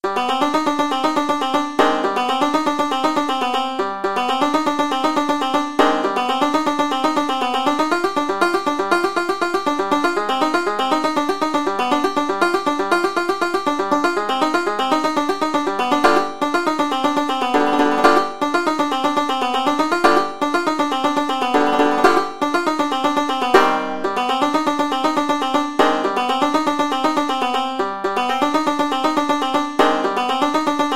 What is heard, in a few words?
banjo